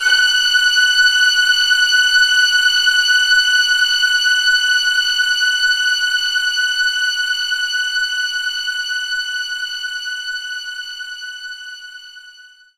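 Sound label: String Loop F sharp 5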